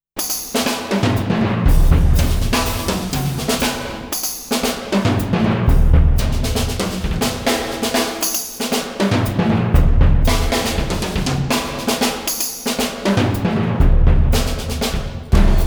Jazz Variants

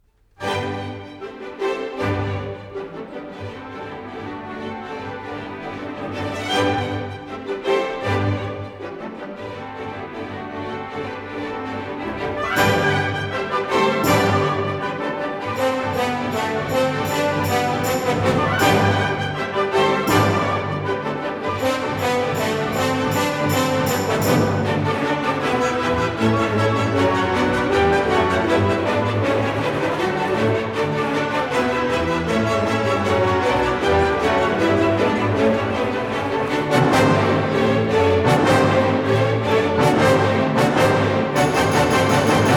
Trepak (Russian Dance); 차이코프스키; 호두까기 인형

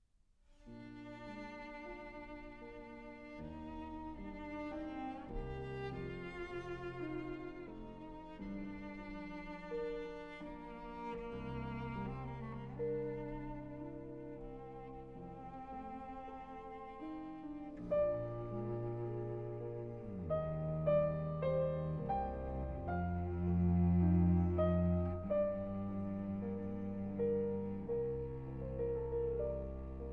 Cello Sonata; Frederic Chopin; op. 65 in g; 3 Largo

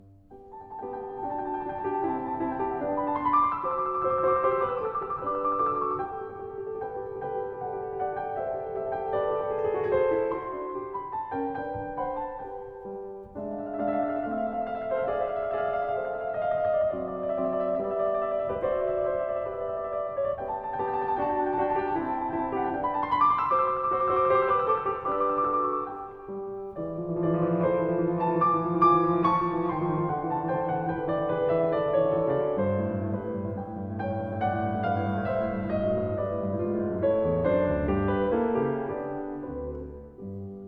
Ten Variations in G major K 455 - Variation VI; Mozart in Vienna; Linn

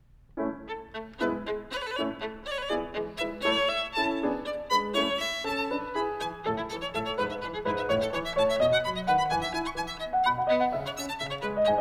Violin Sonata In A, Op. 2; Vivaldi

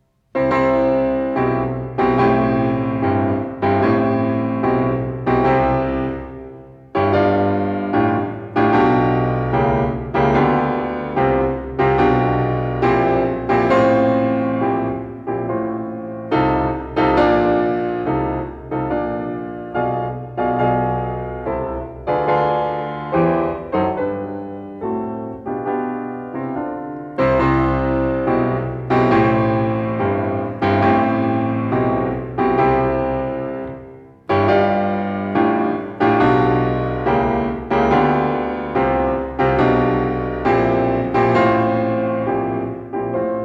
Alla marcia maestoso, C major, piano; Beethoven; Diabelli Variations in C major, op.120; Brilliant Complete Beethoven Edition

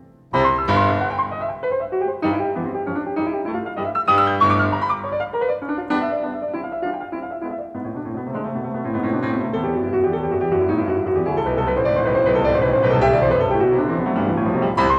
Vivace, C major, piano; Beethoven; Diabelli Variations in C major, op.120; Brilliant Complete Beethoven Edition

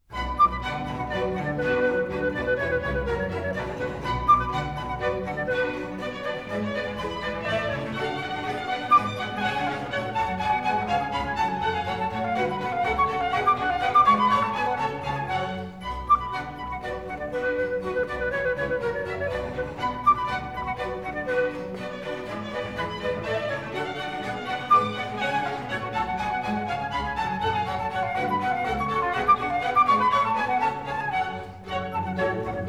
Badinerie, from Orchestral Suite No.2 BWV 1067; JOHANN SEBASTIAN BACH

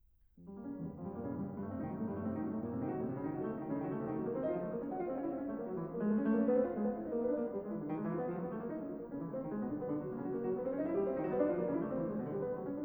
Piano Sonata no. 2 in B flat minor; Frédéric Chopin (1810 - 1849); op. 35; Finale; Deutsche Grammophon

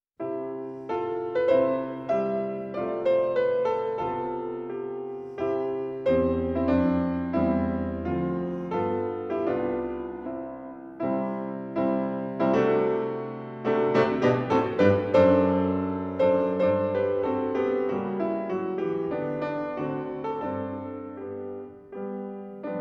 Andante in C major Op.102; Mendelssohn Piano Concertos and Piano Music

